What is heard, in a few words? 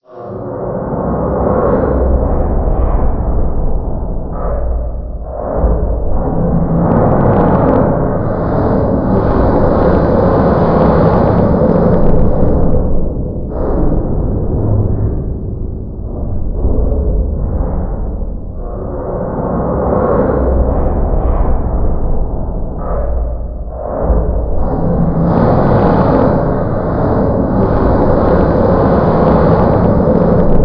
breakup; bismarck